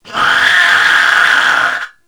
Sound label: Mariner Zombie Attack, Vocals; Grineer Mariner; Warframe